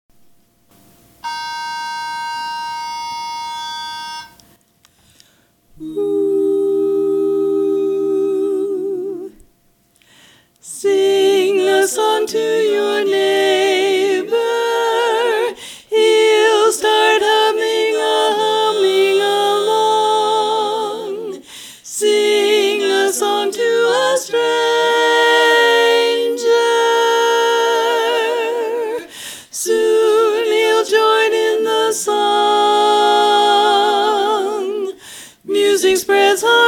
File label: Harmonize Tenor